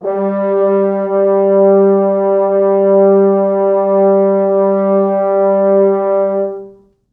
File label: 4 FrHorns; G2; East West; Quantum Leap Horns Sax; 4 FrHorns MOD